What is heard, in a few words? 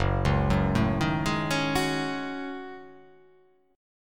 Gb Minor 6th Add 9th Gb down; arpeggiated; JGuitar